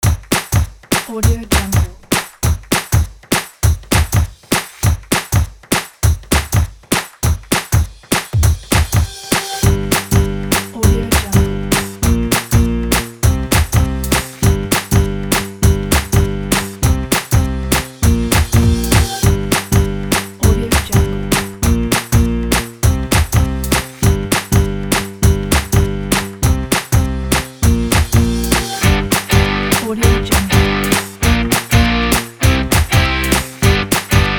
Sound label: upbeat positive indie